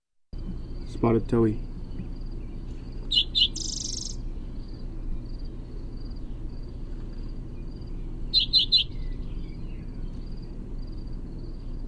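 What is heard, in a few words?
Spotted Towhee Primary Song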